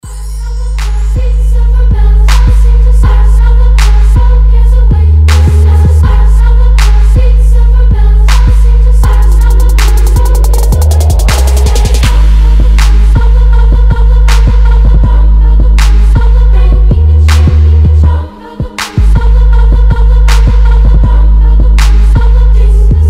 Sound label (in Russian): rington rojdestvenskiy trap